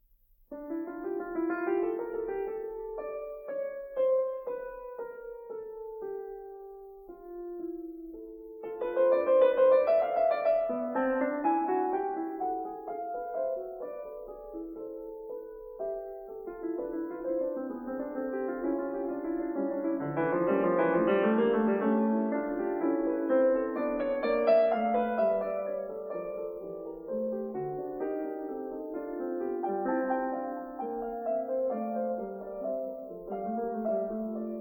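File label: No.6 in D minor Fugue, piano; BACH; WTC; BOOK II; Feinberg, Samuil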